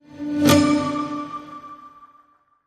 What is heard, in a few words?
Whimsical Pluck Ping